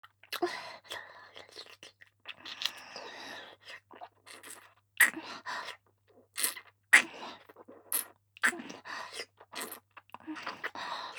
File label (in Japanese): 乳首舐め; ポーラ（妹）